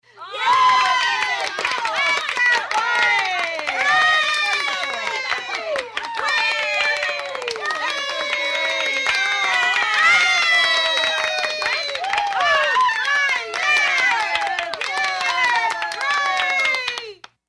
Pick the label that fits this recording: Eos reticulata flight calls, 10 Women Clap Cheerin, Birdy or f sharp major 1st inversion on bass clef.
10 Women Clap Cheerin